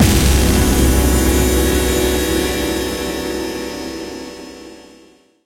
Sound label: stinger; Mirror's Edge (2008)